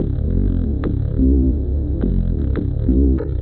Acid Bass

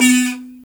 BUZZ XYLO B 4; Spectrasonics; Heart of Africa 1

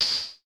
HH, Vermona Mono15 Drums

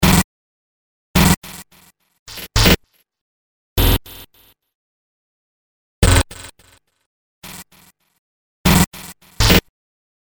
Drop Out, Sound FX; Industrial

